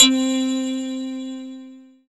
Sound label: SYN, Roland, digital